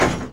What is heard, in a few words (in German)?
Metall